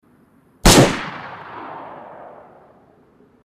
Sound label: single repeater gun; dayz